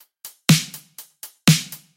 122 BPM Battery Full Drum Essentials Pro; full drum kit

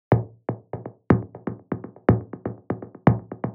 122 BPM Rythm Impacts; mmb studio melodic

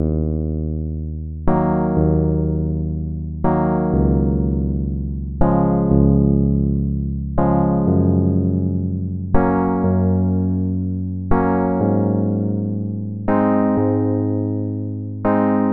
122 BPM Tonic D Cmaj Amin Chord Progress; mmb chord progressions essentials

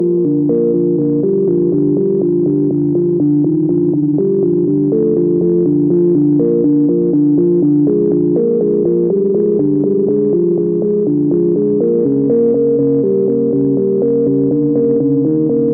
122 BPM Melodies Dmaj Cmin; Tonic F; mmb studio soundtrack essentials

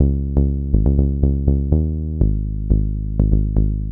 122 BPM Bass Synth B maj G min Tonic C; Pro Bass Synth Studio Kit; MMB

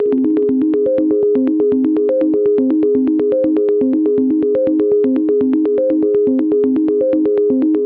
122 BPM Arps C maj A min Tonic G; arp essentials; Flux Arps

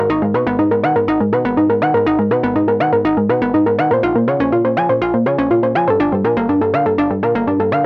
122 BPM Sequence Gmaj Fmin Tonic C; innovative sequences 1

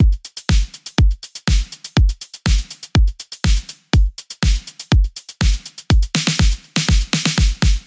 122 BPM Battery Full Drum Forge; full drum kit

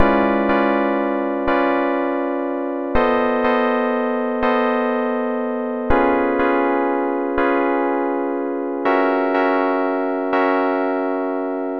122 BPM Tonic C Fmaj Dmin Chord Progress; mmb chord progressions essentials

